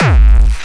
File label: HardCore Kick